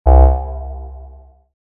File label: death